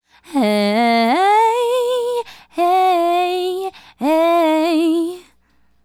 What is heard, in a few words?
Female Solo, Hip-Hop and RnB Vocals